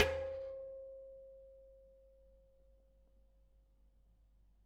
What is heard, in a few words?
Bicycle Spoke